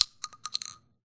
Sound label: 12 gauge shotgun shell drop; Titanfall 2